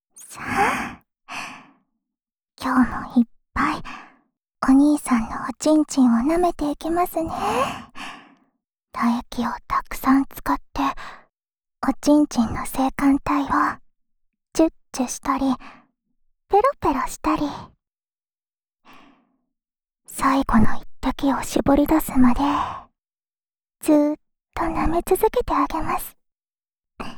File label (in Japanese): オナサポ用フェラチオループ, ASMR